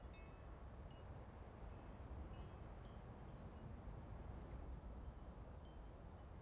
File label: BG Wind Chime; blue archive